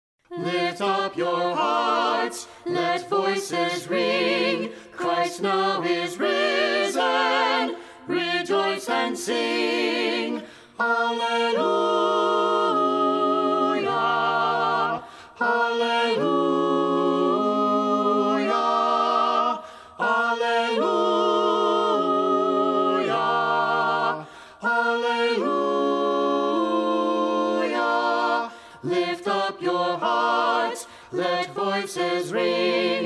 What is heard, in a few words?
easter no piano